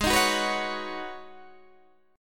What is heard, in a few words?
Ab Minor 11th, Ab, Guitar, DADGAD